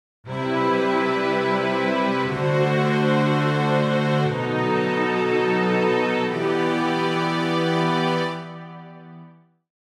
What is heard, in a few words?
strings